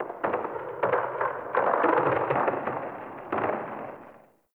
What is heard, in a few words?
Landscape Glacier Ice Distant Medium, Ambience; One Offs; Warframe; Venus Open World